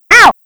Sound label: player gets hit; Pinocchio 1995 SNES; gamesoundopedia